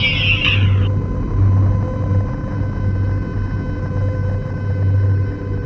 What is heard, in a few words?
SPACE-FX